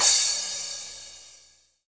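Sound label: cymbal crash; Best Service Gigapack 2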